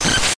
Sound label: Distorted glitch